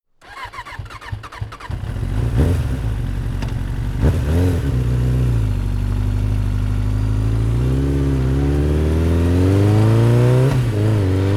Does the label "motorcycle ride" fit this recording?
Yes